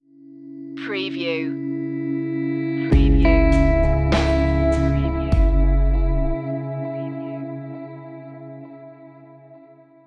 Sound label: sedated stinger